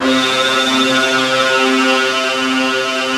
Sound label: big disto; East West - Global Sounds